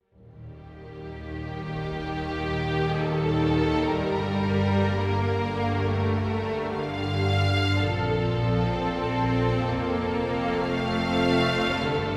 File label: ORCHESTRAL STRINGS; LUX ORCHESTRAL STRINGS; sonuscore